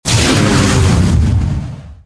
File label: pschreck